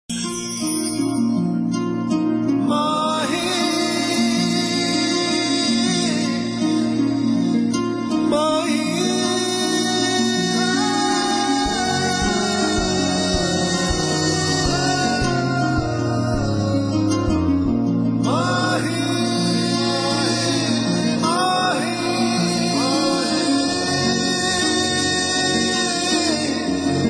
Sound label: Tune